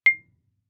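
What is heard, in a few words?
Marimba yarn fortissimo C2; MIS Pitches - 2014; Percussion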